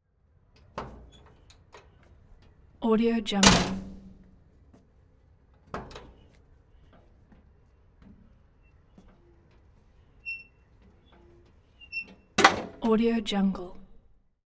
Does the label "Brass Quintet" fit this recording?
No